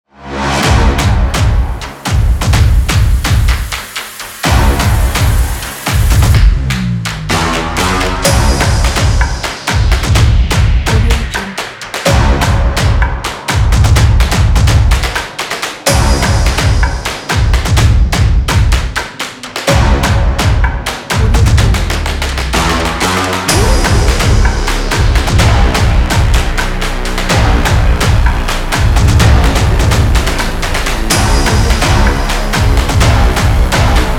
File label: promo stomp; onymusic